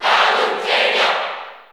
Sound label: Cheer English; Palutena; SSBU